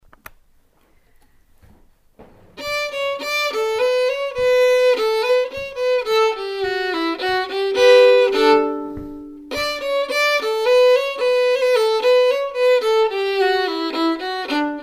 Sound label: Mazurka, fiddle; Blanchard Mazurka